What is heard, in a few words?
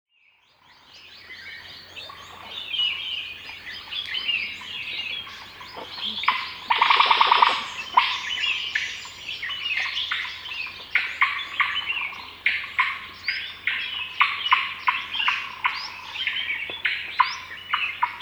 Indian Giant Squirrel